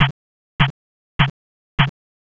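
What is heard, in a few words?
footsteps march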